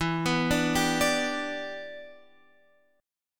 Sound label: E Minor 7th